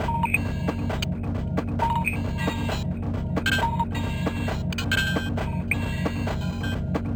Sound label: Machine